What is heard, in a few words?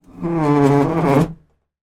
household door shed hutch squeaky open; zapsplat